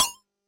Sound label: menu close, ui menu; freepixel